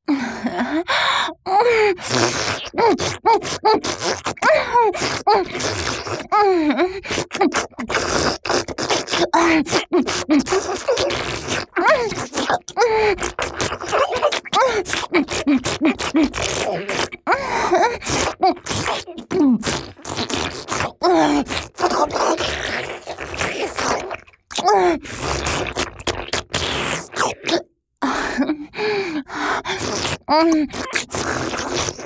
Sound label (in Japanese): 頭を上下に振って陰茎を深くしゃぶる; 実用ボイス抜粋集